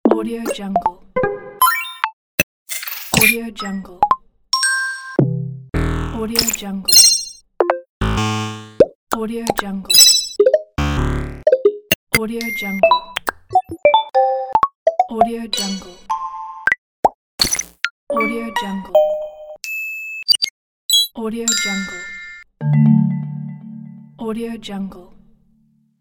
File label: interface pack; medusamusiclab